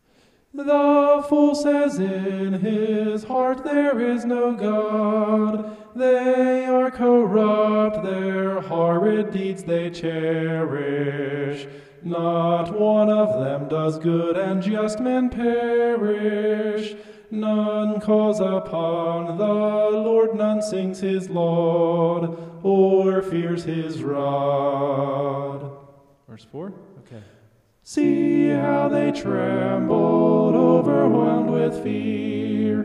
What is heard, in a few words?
vocal homoph; psalm 53